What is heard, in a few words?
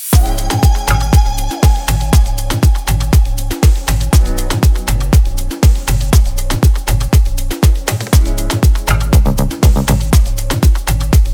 Afrohouse